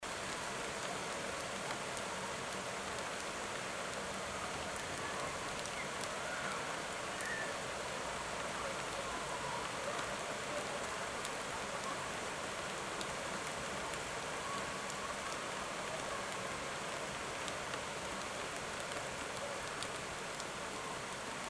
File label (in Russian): zvuk dozhdja